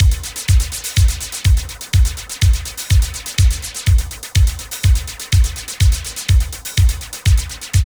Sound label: LOOP, Tekno Industrial; East West